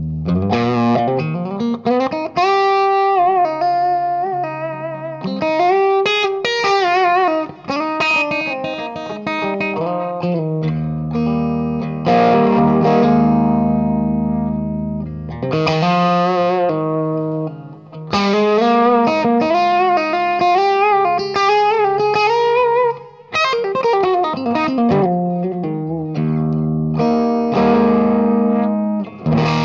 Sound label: Clean